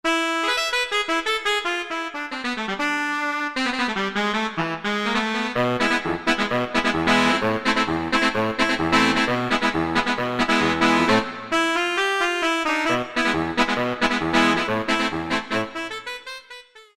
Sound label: Sax, Yamaha TG33; Factory Presets